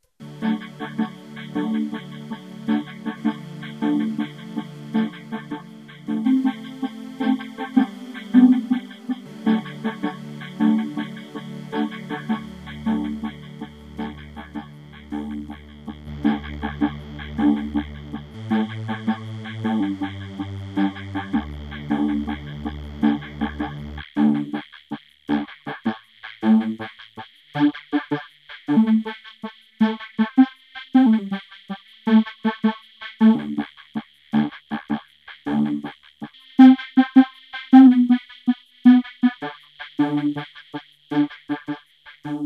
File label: Vocorder; "Drum sample" synthese input: synthesizer chords, signals mixed; flame2